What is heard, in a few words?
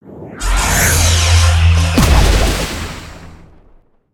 Spin; Skullken Inventor; creatures organic